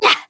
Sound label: Grunt Attack; Maeve